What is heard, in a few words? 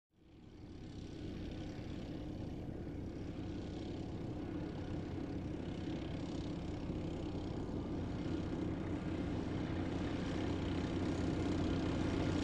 Old Military Truck passing by